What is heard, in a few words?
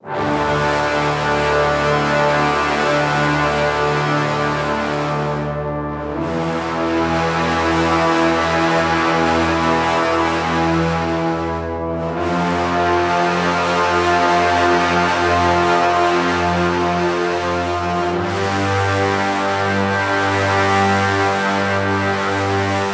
Low Brass - Dm 80BPM; Construction Kits; STEMS; Ghosthack; SkyRealm; Kit 07 - Ring Of Power